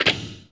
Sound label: lock mix